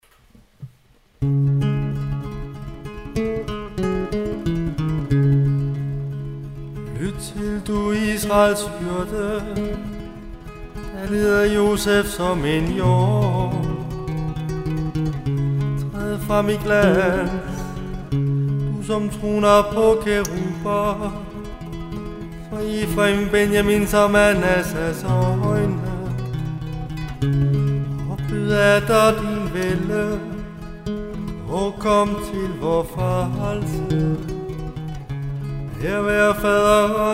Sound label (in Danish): tone gregoriansk